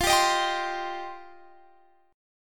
F 7th Suspended 2nd F down

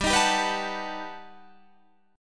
G Augmented Major 9th, Guitar, Open D

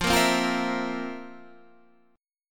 F Major 7th Suspended 4th F, Guitar Standard; FM7sus4 strummed; JGuitar

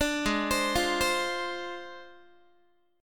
Ab 6th Flat 5th Ab, Guitar; 17,16,15,15,16,x; arpeggiated

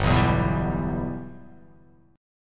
G 6th

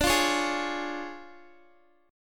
D Minor Major 7th Flat 5th, D, Guitar; Listen to DmM7b5 strummed; JGuitar

